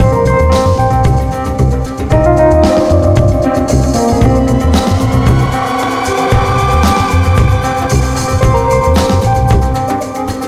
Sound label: Chop Walkies Music Loop; iFruit; Android, iOS